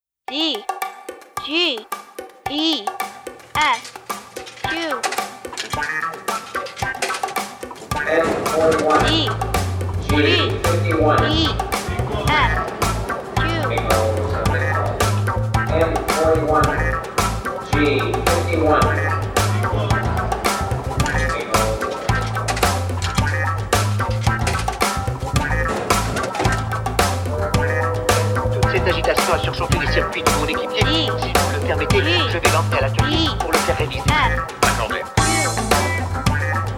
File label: jazz